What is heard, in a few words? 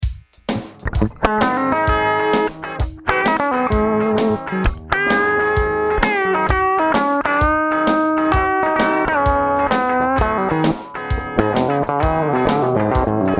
compressor